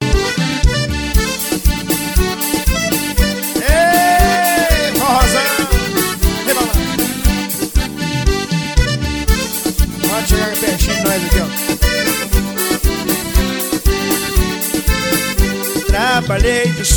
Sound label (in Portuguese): forro